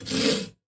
bowsaw stroke

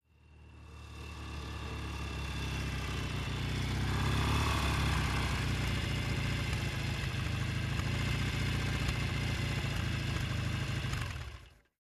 Mcycle Norton Medium

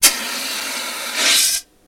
Fire Extinguishe; fire extinguisher

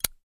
Zippo Lighter Close

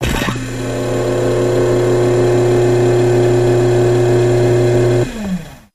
Hydraulic Pump Dent, seats & stools

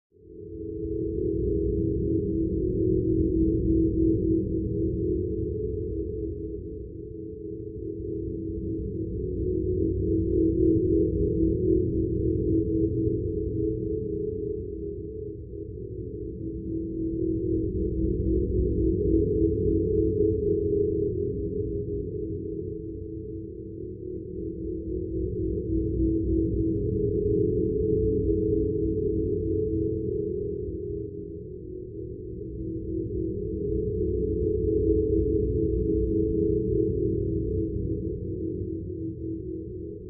Ambience Modulatio

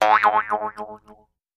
Jews Harp Vibrato, boings

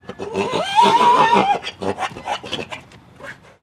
Donkey Inhale Bray

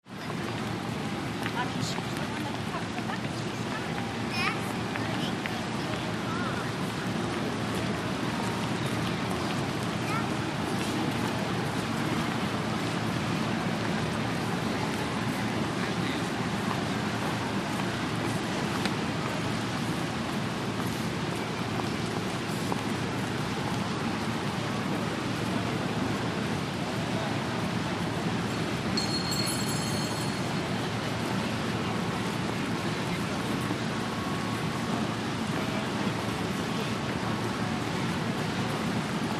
Train Stn Grand Con, train station

